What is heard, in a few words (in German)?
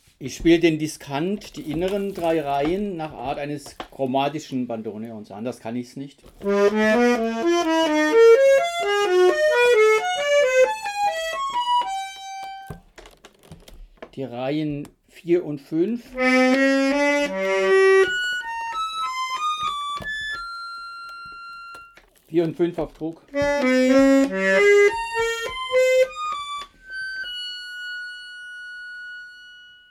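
SchöMa diskant, Bandoneon chromatisch C-Griff, Modell Praktikal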